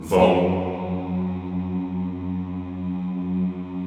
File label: CHO F#1, CHO Vohmm Houuu, CHO Vohmm Hohmm; Sound & Vision; Gigapack I CD 2